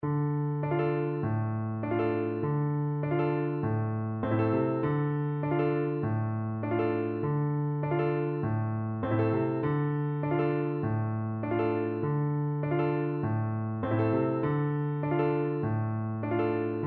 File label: trumpet